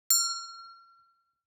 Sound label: new message; jscommunicator